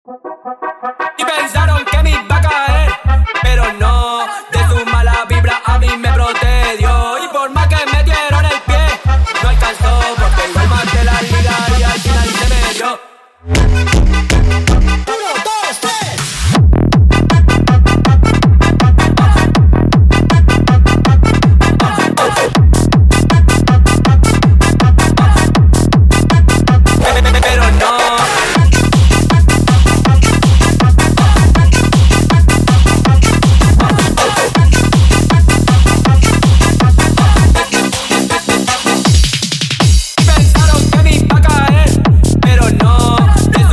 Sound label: Funk Remix sped Up